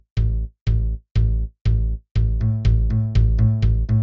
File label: footbass